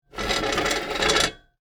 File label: Table Slide Forward, Foley, Table, Slide, Forward, Metal, Movement; BlastWave